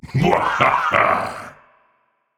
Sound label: Laugh; Assassin; Chained One; Realm Royale